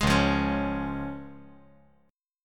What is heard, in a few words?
C sharp Major